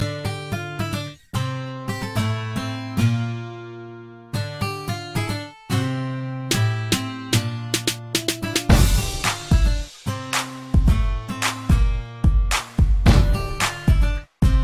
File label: hip hop guitar; ringtones